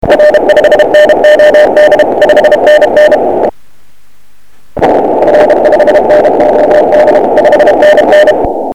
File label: 28 cw